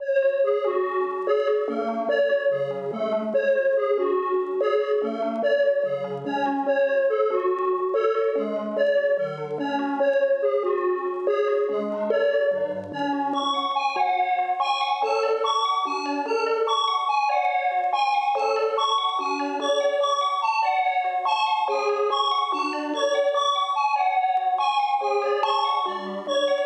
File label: Dark Loop - 144 BPM F# Min Bells; Stems; Paranoia